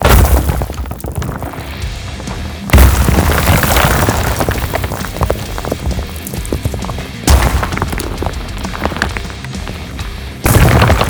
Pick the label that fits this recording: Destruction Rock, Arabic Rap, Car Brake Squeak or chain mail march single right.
Destruction Rock